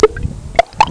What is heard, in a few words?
perculator, cartoon sfx; Sound Effects for Multimedia - Volume 1